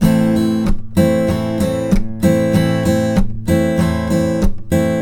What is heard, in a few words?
riff 96, Blues Guitar; ILIO; Fretworks